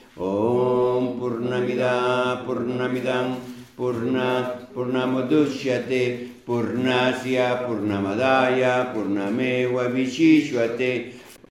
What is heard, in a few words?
Om Purnamidath Mantra; mantren